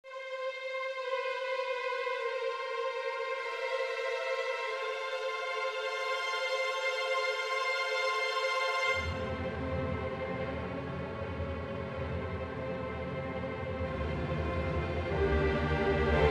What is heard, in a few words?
Trem sw Fine; xv-5080; SRX